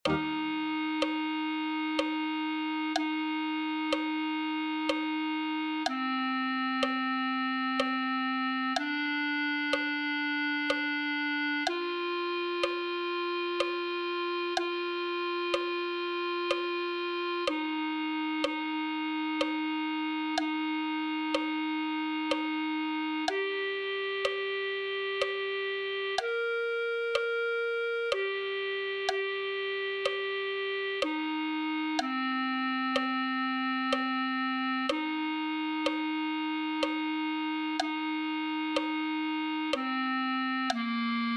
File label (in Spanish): clarinete